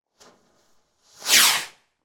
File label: firework rocket small launch; sound effects one